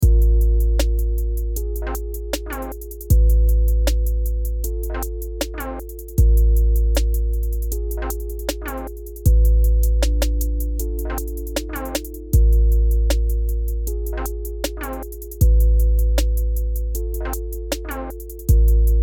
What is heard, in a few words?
78bpm; beat pour mcee; yeux rouges